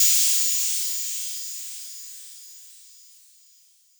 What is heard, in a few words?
Tech HH, Yamaha FS1R